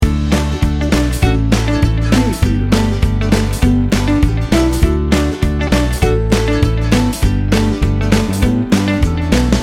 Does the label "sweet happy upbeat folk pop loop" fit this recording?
Yes